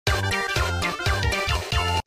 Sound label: microgame start sfx; warioware